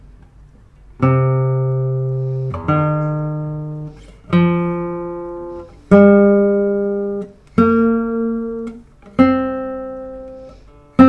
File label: slendro scale